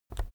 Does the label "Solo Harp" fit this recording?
No